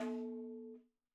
madalam notes R; Sample Banks; Percussion; MADHALAM

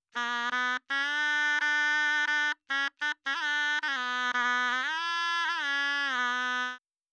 3-4 Bm 70 Dry, Melody, Shenai; Sample Banks; 4-4; Bmin

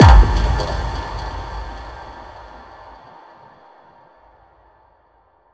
FX Impact; Sample Banks; Percussion

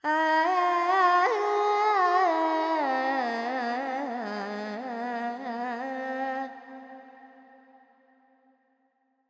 Pentatonic, Vocals, Carnatic Female, Alaps, G; Sample Banks

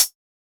HiHat, Percussion; Sample Banks

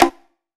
Perc; Sample Banks; Percussion

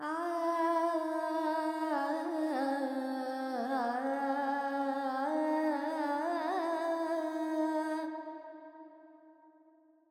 Verb Charukesi, Carnatic Female Alaps

